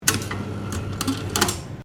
MACHINERY INDUSTRIAL TAG DISPENCER OPERATING STEREO